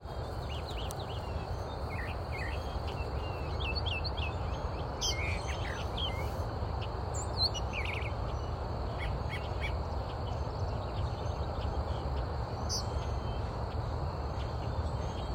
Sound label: eastern kingbird brown thrasher bridge; field recordings; scout island